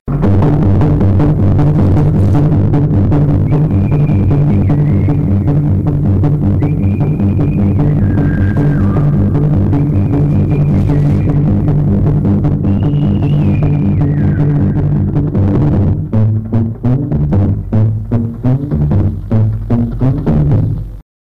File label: Piece for tambor